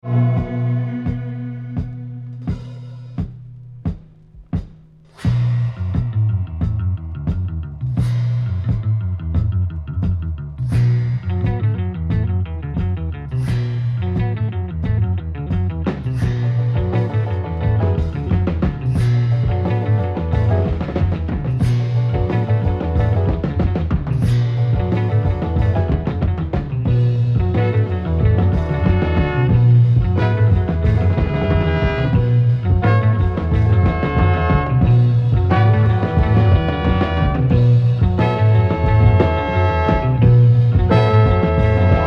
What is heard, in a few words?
Vocal instru Ens